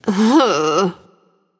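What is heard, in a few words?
ugh, female; smite; voicepack; hera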